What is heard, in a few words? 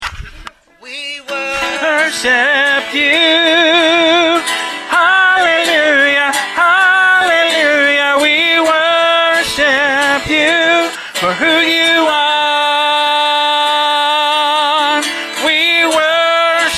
tenor